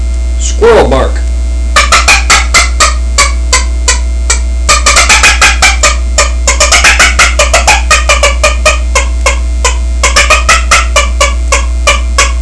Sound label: squirrel bark; dixie